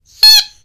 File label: Squeak Duck